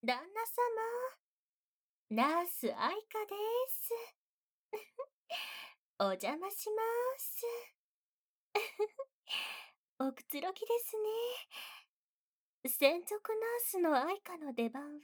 癒しの耳舐め, ASMR; ナース愛華

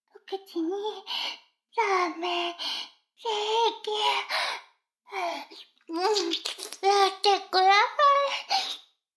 フェラ音声; 逆時間停止されて

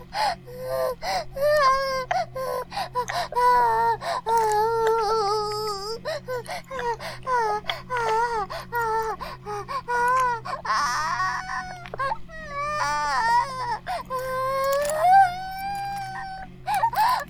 バイブ放置; イクたびにわけて

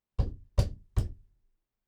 ノック; 動作系

